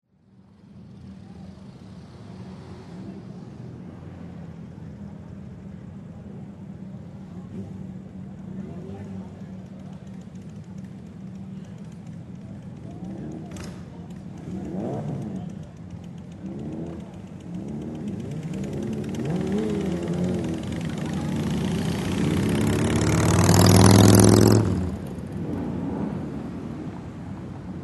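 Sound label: zvuki nochi, night sounds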